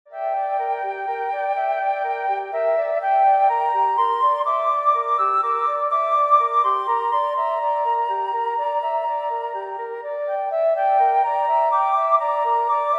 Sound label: duo 2 flutes